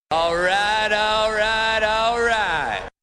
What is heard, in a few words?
9 Mm